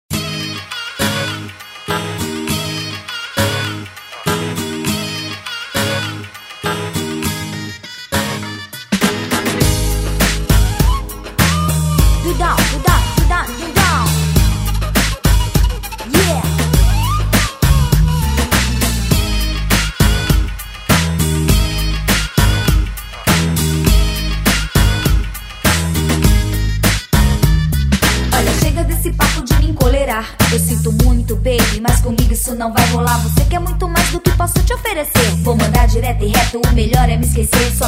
dance music

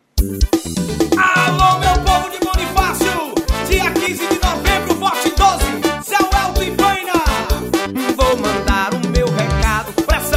jingle

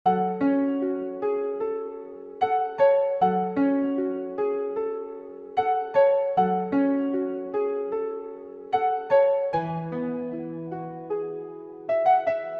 base pensative; Cariri Bass

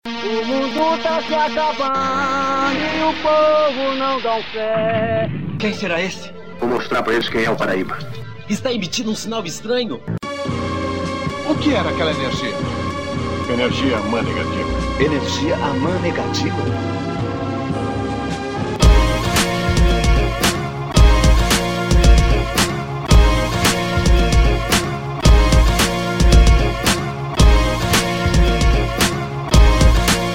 instrumental

